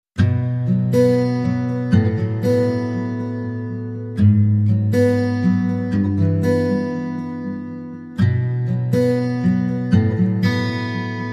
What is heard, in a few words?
Guitar Only